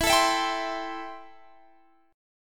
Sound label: A-Minor 7th E